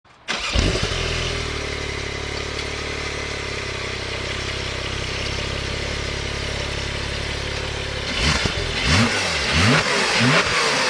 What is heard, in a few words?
Porsche 911 Turbo S Sound; Porsche 911 Turbo S Accelerator and 0 to 300 Sound